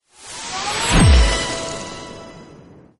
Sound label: win big; riviera Star; Fantasma